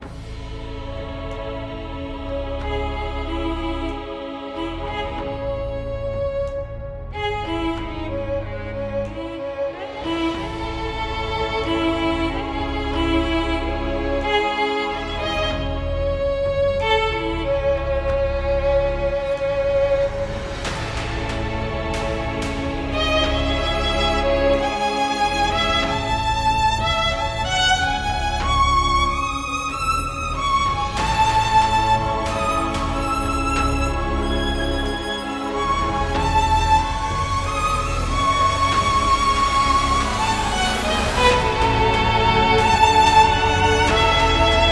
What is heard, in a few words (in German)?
Solo Violine, legato; Zauberstrings